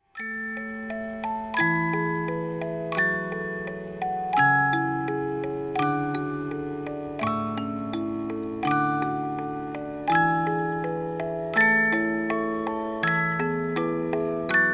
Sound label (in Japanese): canon hayame, musicboxes